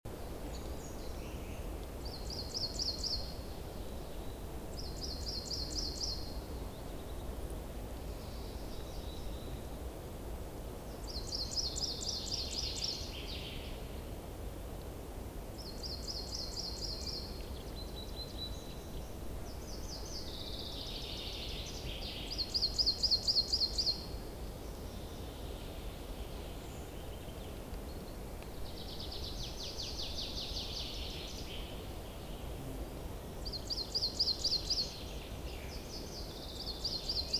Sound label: european woods ambience loop